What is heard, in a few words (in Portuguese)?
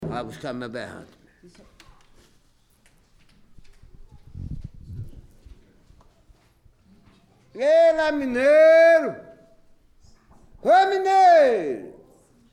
Berrante